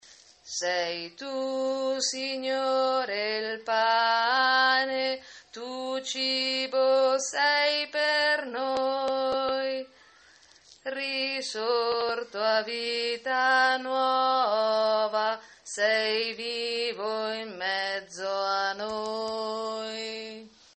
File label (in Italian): tenori